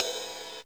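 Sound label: mt800 cymbal, Casio MT-800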